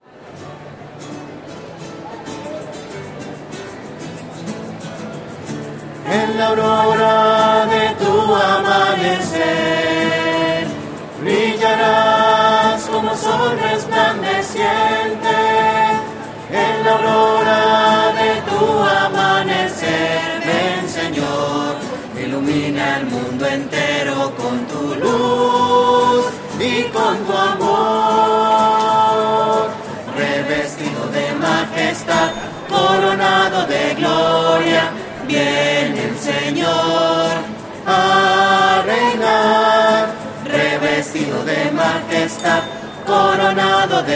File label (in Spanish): voces; misas 2012